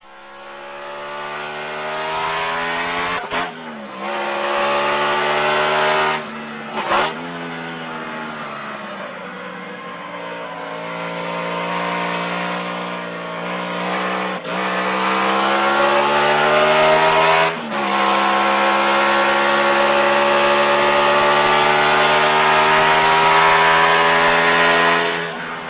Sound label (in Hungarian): Lotus 18, motorhangok; autosport